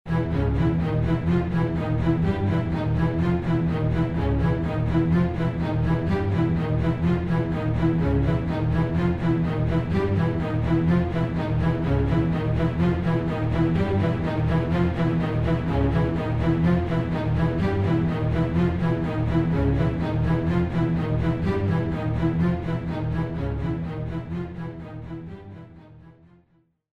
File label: Jingle Drowning